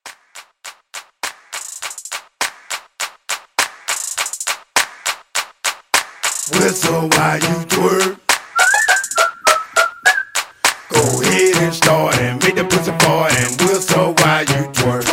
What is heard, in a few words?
Party Starter Re Drum